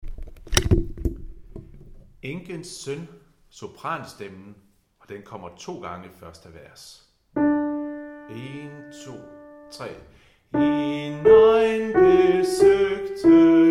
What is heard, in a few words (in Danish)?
sopranstemmen; Enkens søn; harlev koret